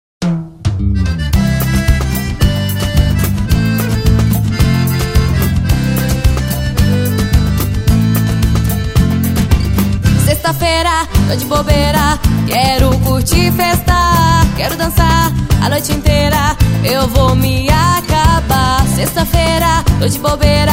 a viola chora

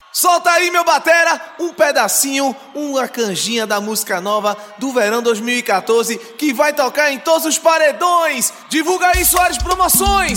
pancadao eletrico coordenacao swingao ao vivo

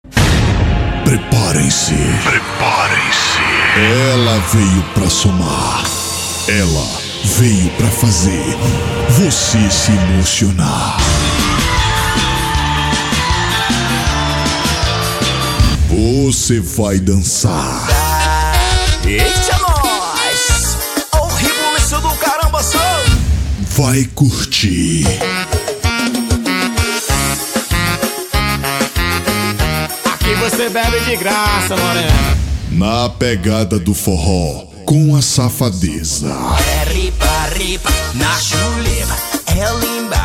vinheta forro

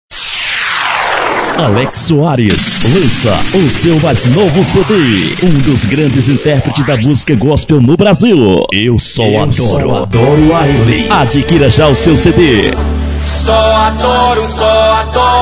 cantor vinheta

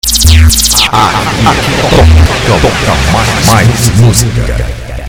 techno som

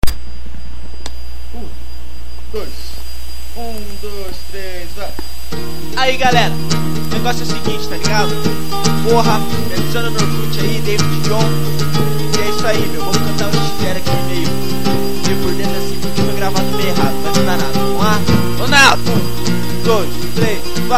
rap

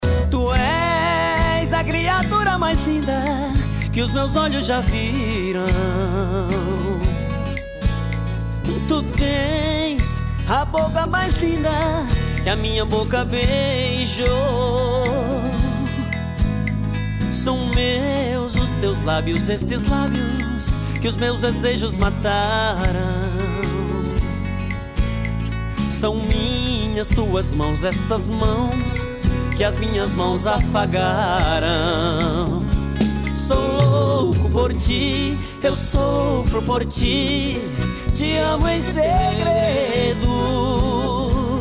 sertanejo universitario

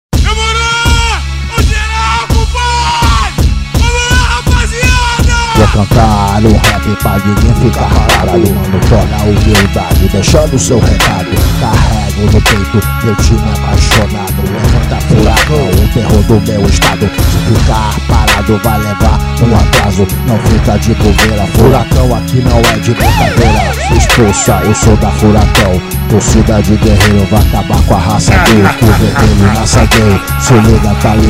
rap

